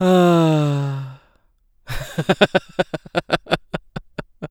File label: laugh; Eternal Return; ENG